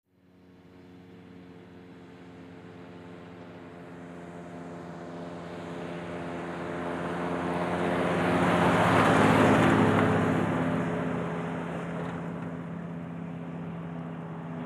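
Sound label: 1947 Dodge Medium Speed, pick up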